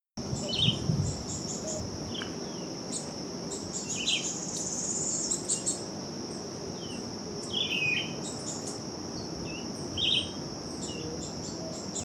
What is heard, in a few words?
Chivi, Chivi Vireo (Vireo chivi)